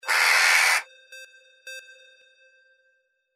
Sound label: CROW